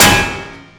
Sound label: block; Fate Undiscovered Realms